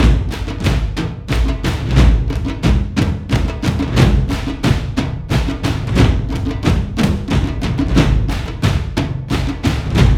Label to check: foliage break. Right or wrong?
Wrong